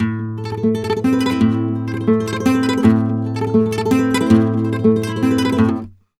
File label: Flamenco, Guitar, Tremolos, 173, am